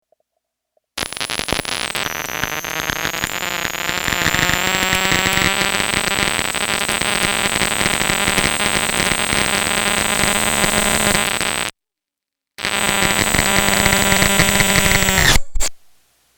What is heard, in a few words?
Vibration